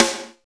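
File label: snare attack; Roland L-CD701; Snares 3; Sn Modules 3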